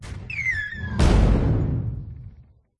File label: artillery; attack sounds; Unciv